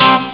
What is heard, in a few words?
set guitar; Amiga Soundtracker sample